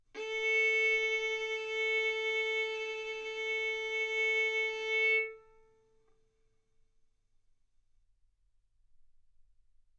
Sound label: Violin arco A4 B4; mf; sul A; stereo